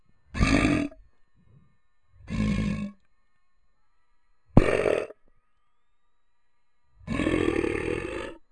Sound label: growls; Brickshelf